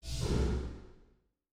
space dragon pulse; Stellaris; guardians

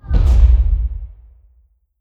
bombardment explosion; weapons; Stellaris

